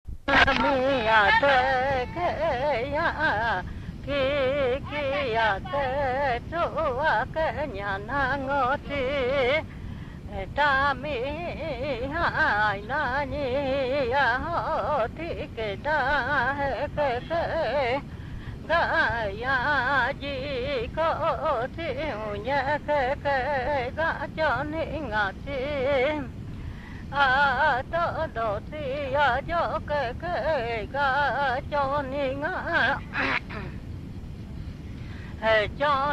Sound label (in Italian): canto, Ayoreo